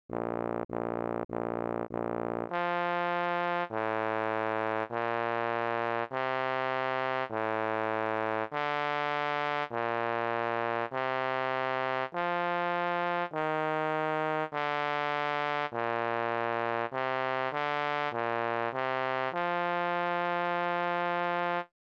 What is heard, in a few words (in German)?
Bass; Blaeser